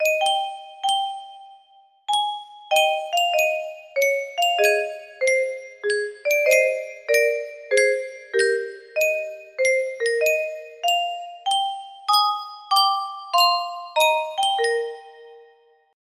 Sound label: custom tune music box